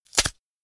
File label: Glasses Break